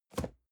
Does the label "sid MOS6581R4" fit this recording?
No